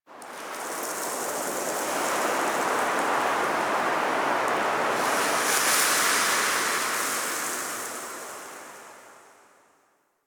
Sandy Wind; Ambience; Man In The Wall

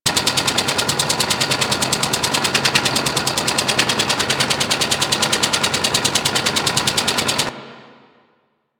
Rail Jack Destroyer Machine Loop; Ambience; Crew Ships; Grineer

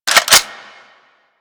Cock, Weapons; Latron

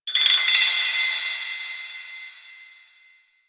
Fortress Chains Small; Ambience; Warframe; Grineer Fortress

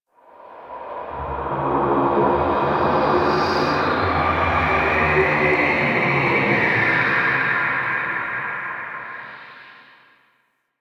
Ghostly Whoosh; Warframe; Man In The Wall

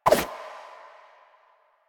Run Whoosh, Foley; Enemies; Soldiers; Footsteps; Warframe; Lasrian; Butcher

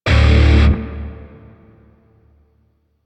Grineer Shawzin Maj Chord B; Music; DE; Warframe

